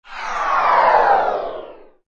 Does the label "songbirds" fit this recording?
No